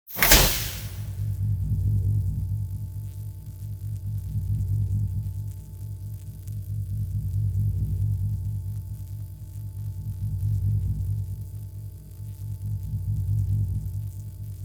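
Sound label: Sci-fi energy Force Field Hum Slight Crackle Power On And Stable Operation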